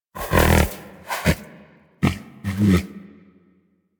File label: Predasite Vocal Snore; Warframe; Hunting Critters